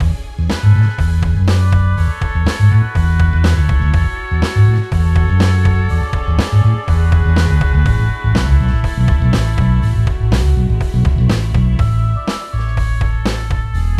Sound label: Upbeat; Negligee